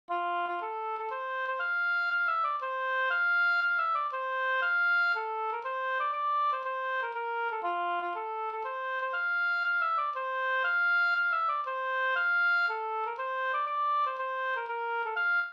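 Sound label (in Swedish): polska Gokpolska fr Västmanland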